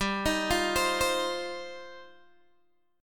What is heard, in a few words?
G 7th Suspended 4th Sharp 5th G, Guitar Open G; down